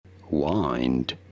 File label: wind corda